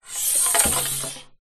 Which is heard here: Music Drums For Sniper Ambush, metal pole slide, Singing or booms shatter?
metal pole slide